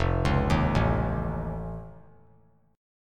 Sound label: F Augmented 7th F, chord; F+7 arpeggiated; 5,8,6,7; JGuitar